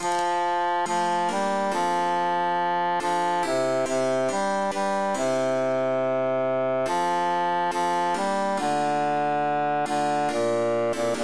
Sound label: bassus emphasized; tant que vivray